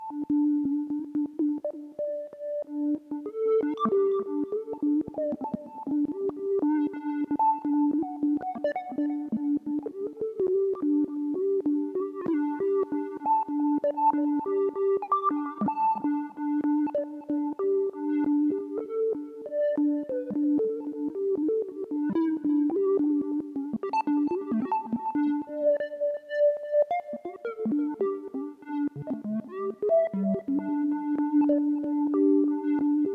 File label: noise